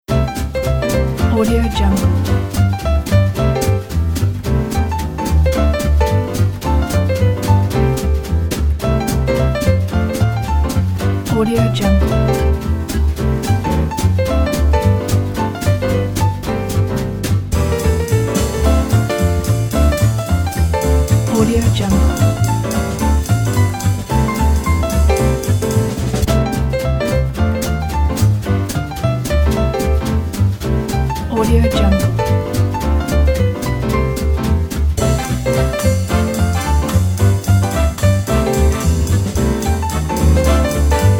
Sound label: fast swing jazz